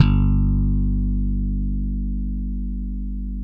SLAP BASS, Electric Basses

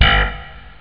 slap bass; jazz jackrabbit 2; M8

